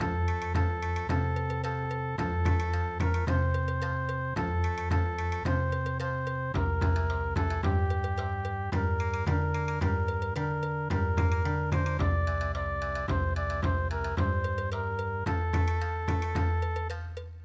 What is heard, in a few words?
Bon Odori